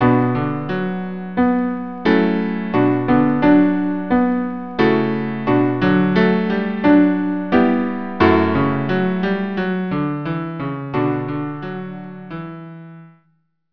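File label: cello piano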